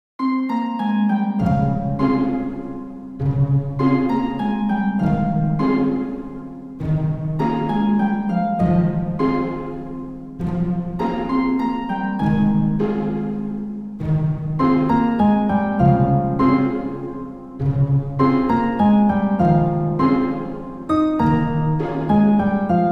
In Forest; 감성 BG

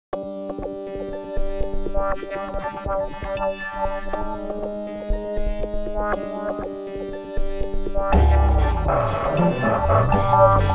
Something Happen, EDM; 리듬

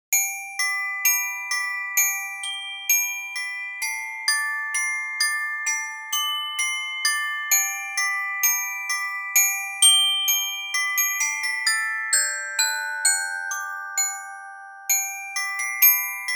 Orgol Song, KIDS 귀여운

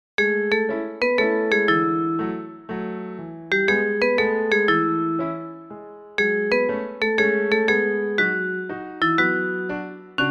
Newage; KIDS 귀여운

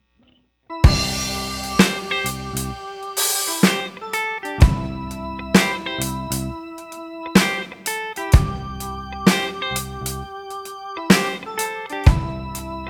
Reggae; usimmusic

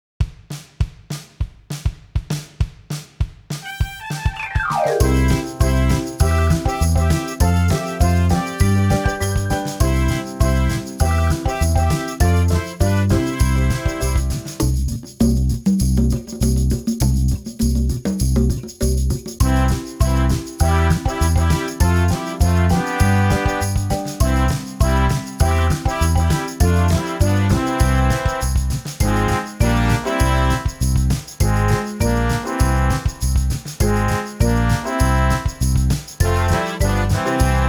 Little Foot step

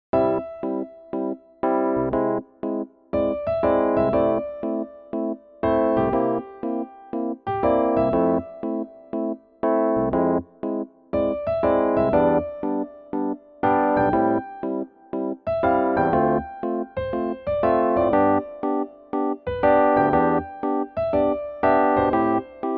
Newage; 밝고 맑은

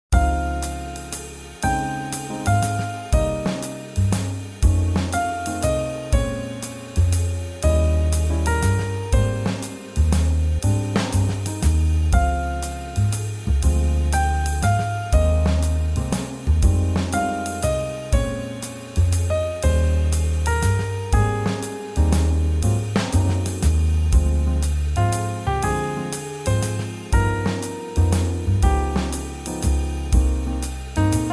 Swing Jazz

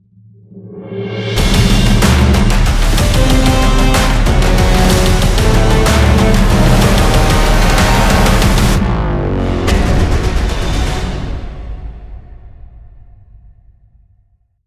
Dobro FX

